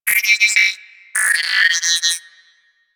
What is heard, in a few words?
Guild Computer Beeps; Warframe